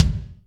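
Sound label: bass drum, BD Real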